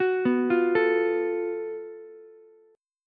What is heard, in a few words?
Gb Minor Major 7th Double Flat 5th, Ukulele, chord; arpeggiated; JGuitar